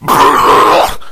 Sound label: rage at victim, infected, male